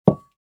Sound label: Cooking pan sizzle put down on metal hob